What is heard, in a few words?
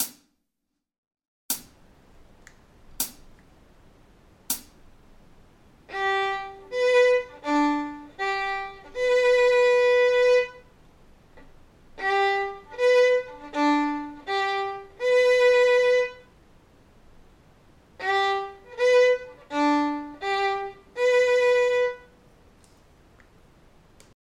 g arpeggio g b d g b, fiddle; Exercises